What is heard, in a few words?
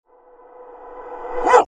bark dog reverse real; Halloween